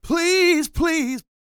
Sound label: SING, Vocal Planet R&B & Dance; ILIO